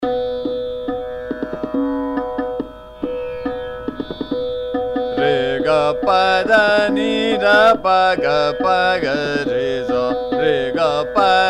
Sargam - Re Ga Pa Dha Ni Dha - Ektaal Drut, Saalag Varali